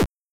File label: Bullet; metroidvania; 1bit godot course